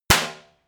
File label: party popper; hits-explosionen